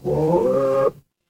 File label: animal cat meow